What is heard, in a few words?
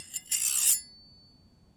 Serrated Knife - Scrape, kitchen knives; Micro Pack